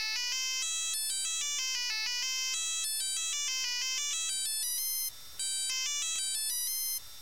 ringtone; highseas; gta iv

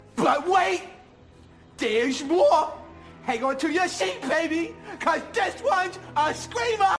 screamer

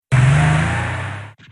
cobra sound

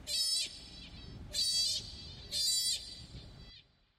baby sea otter joey learning to swim

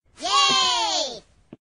minions cry

